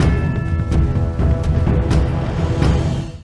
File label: bomb planted; music; sudden attack; tfgo